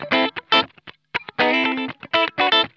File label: SCRUNCH, Funk Guitar; Zero G - Funk Guitar